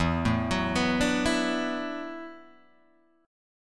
E 7th Suspended 2nd Suspended 4th, down, Guitar, Open G